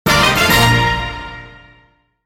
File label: UI Fight Win; Blue Guardians